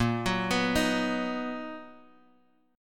B Major 7th A sharp

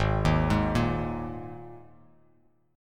Ab Suspended 2nd Bass Standard; arpeggiated; JGuitar

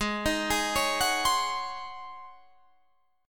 Ab 11th Ab Guitar Drop D; Ab11 arpeggiated

